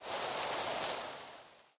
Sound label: stage fail leaves